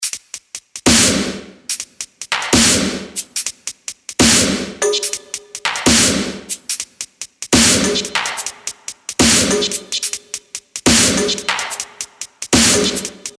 Bpm144 Fwd Top

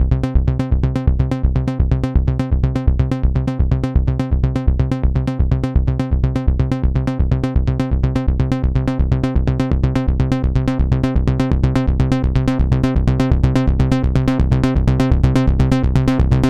king korg tube

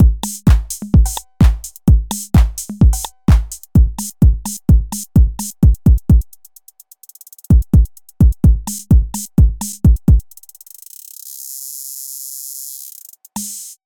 roland tr-8 scatter

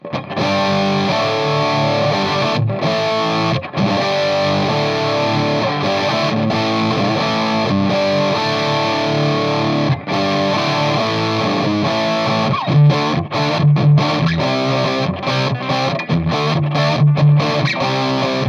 laroqua 212 studio, highgain; wgs et65

